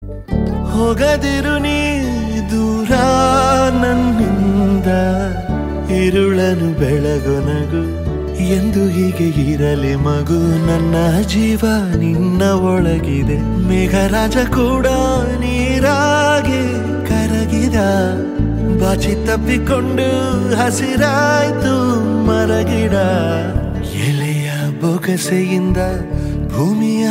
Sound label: Lullaby Song